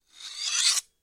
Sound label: Knife Draws, knives